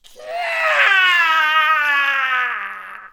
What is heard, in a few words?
samurai shouting; jidaigeki